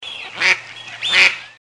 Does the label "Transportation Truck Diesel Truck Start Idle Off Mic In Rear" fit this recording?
No